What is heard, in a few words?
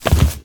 Jump Landing